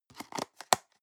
plastic box food small open medium

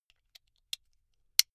buckle plastic insert unsteady close soft

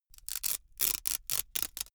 velcro large open slow

